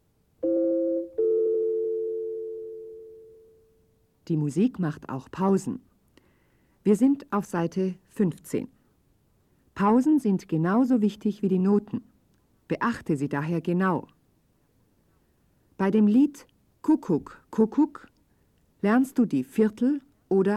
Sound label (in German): Spiel Blockfloete